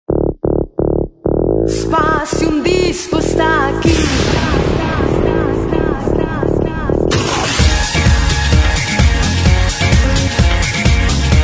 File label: DISCO